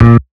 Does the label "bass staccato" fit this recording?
Yes